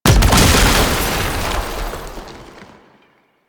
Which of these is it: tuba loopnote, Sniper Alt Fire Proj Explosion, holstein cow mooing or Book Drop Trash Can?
Sniper Alt Fire Proj Explosion